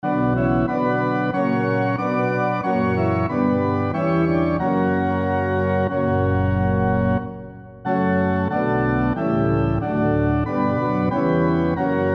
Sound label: SATB on Piano Example